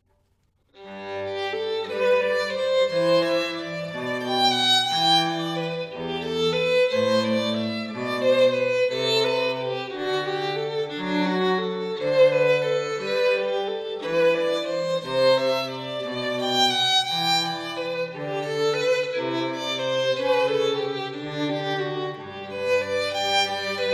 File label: Cello and Violin; Jesu